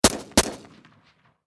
Warfare Machine Gun 5.6 Caliber Sig Sg 552 Commando Double Shot Distant Perspective